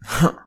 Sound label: take damage; Player; RPG Creation Kit; RPG Sounds Pack 3